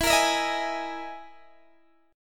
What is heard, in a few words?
E Major 7th Flat 5th E down Guitar DADGAD